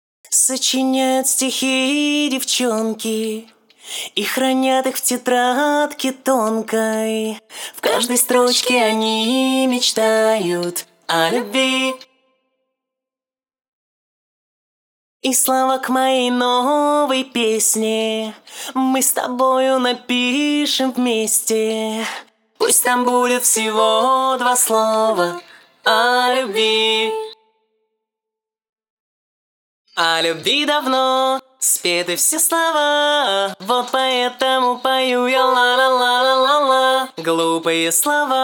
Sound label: acapella 130 bpm